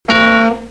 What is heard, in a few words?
bonk